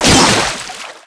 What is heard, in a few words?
watersword crit, weapons; dota; kunkka